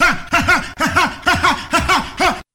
"huh haha haha ha ha hahahuh"; ooh ahh; heh huh

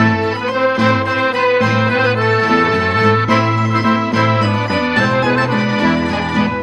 horns fiesta, Loops

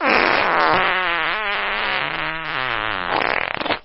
fart long; Farts

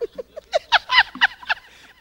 laugh

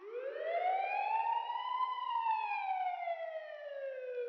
siren, Vehicles

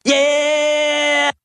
yeah SUNG

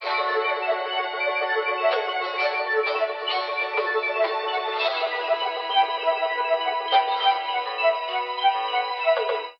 keys, Phonky loops

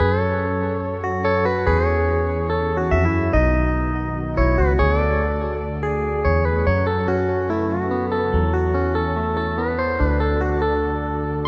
keys, Loops, Sad

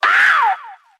ow

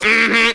mmm hmm

